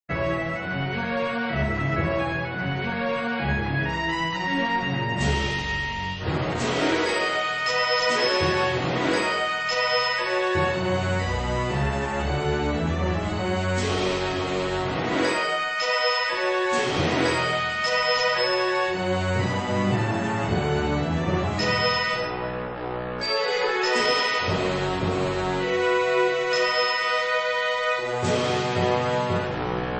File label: Symphonic